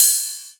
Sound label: Rides, Cymbals